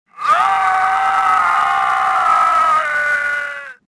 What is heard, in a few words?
falling; Star Wars Jedi Knight II Jedi Outcast; XBOX; shadow